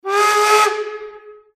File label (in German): Pfiff